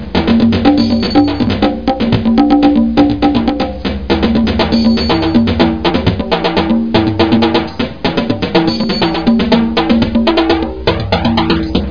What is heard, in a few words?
Latin Rhythem Flanged; Museum of Anything Goes